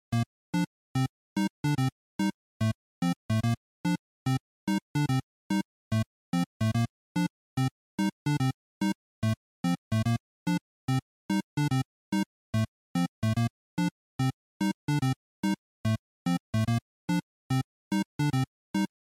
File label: Action Stage, bgm